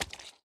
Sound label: shallow water, walking; factorio 2.0.55